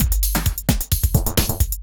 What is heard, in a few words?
Beat, Drums, Eclectic Electronica